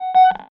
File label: Motion Tracker Blip